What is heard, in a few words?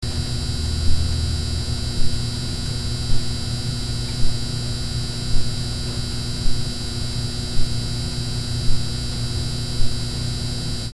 fluorescent light humming; Mike Koenig